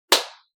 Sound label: smack; shweets sim